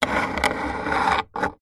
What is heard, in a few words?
human fingernails scratch rub on wood; zapsplat